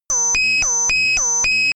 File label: sci fi alarm pager damaged